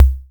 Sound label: Tom Thing; M8; Electronisounds; Enigma Dub Cube One Shots; PERC